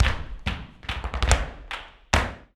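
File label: percussion, FARRUCA TAP, Flamenco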